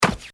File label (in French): petit coup